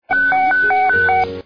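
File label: ambulance alarm sound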